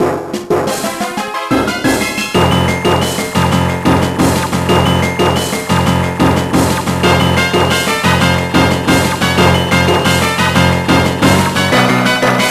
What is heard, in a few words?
Unused Battle Music; Sigma Star Saga; gba